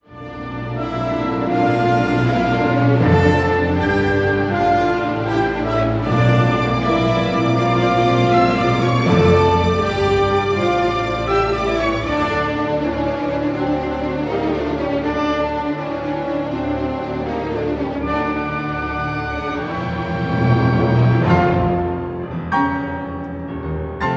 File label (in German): Brahms 2. Klavierkonzert